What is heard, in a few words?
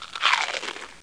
Eating; HOLIDAY